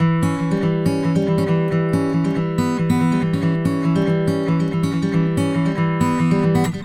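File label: Country Guitar, Verse 1+2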